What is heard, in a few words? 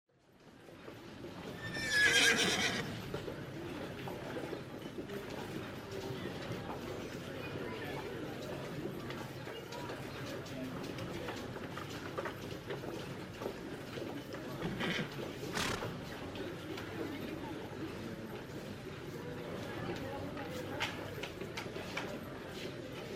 Ambience